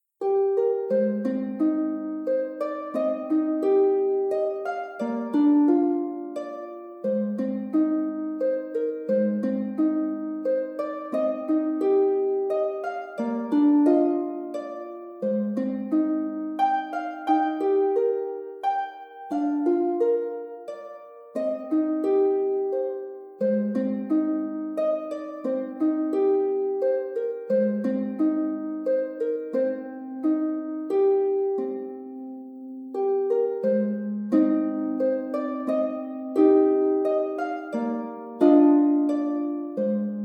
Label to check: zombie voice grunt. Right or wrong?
Wrong